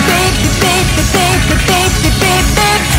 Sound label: layer beep beep beep